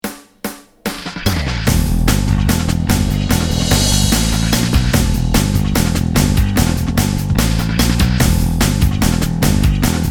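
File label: Battle E minor 147 bpm; Disney Infinity 2.0 Marvel Super Heroes; Arachna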